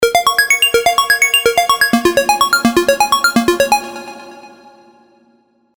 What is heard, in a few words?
CHIPTUNE, Serum; Ninja Gaiden